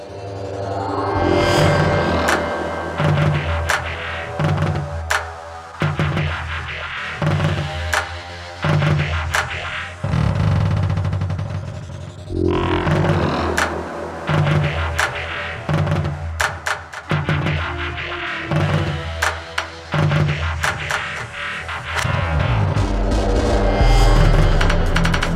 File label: The War Drums